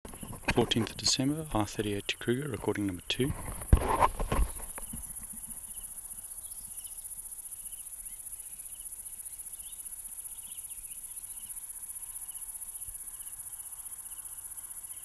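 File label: Platypleura haglundi, Barberton; P.haglundi nr Barberton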